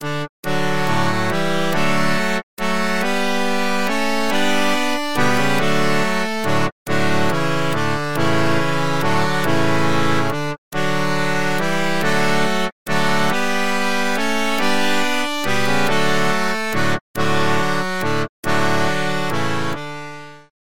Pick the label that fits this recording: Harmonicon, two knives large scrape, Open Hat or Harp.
Harmonicon